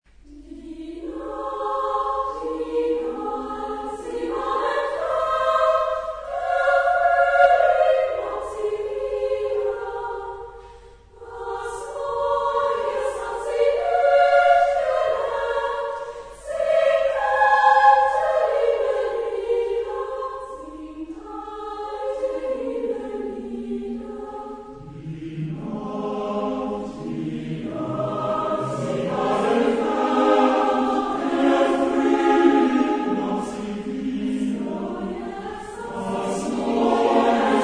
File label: lieder, chorus; Mendelssohn; 6 lieder Op59; Die Nachtigall